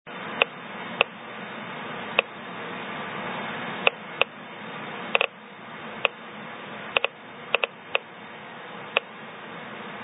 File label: Andersonite Moab Utah beta 1 inch 10 sec